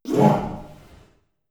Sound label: Worm voice